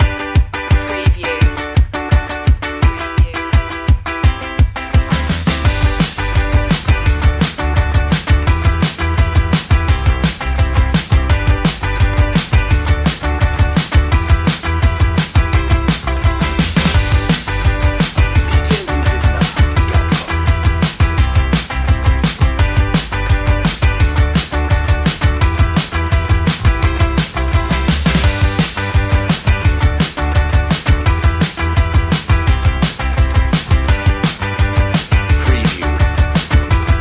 indie people no melody

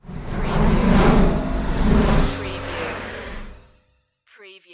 Dark Production Whoosh

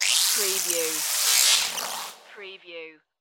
futuristic voltage glitch

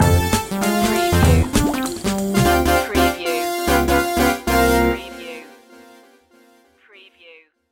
retro video game sweet action stinger

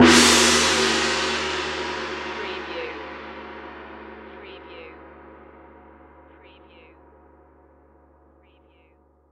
Musical

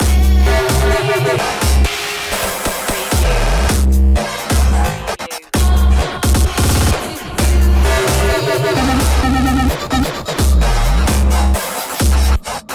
destroyed loop

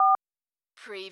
dialtone; Button / Multimedia Sounds

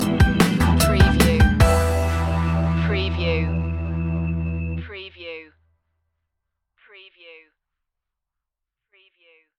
latin flavor stinger

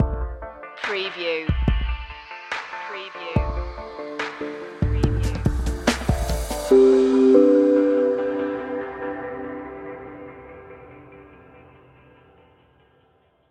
emergent lights stinger

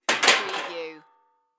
Folding Chair Stack

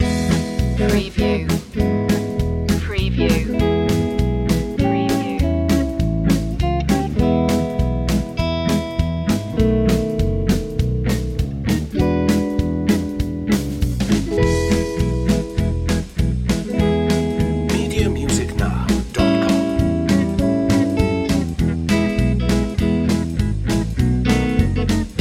texas swing

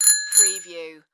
Bicycle Bell Ring; sound-effects-bible

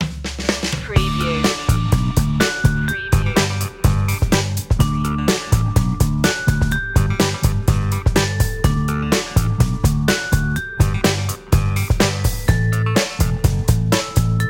happy groove

